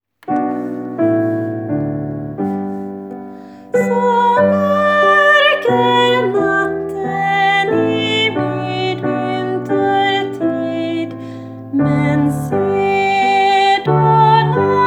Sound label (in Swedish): Lucia så mörk är natten S